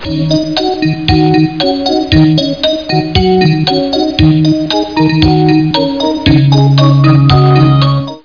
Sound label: funk; Amiga 8-bit Sampled Voice